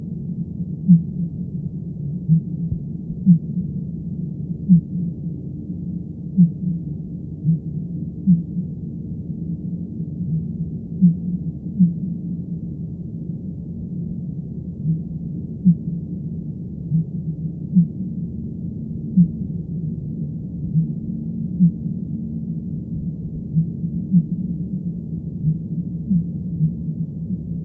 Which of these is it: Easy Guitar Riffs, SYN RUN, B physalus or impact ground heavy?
B physalus